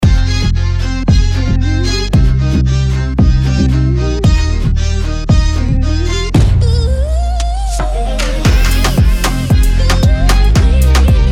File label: open beat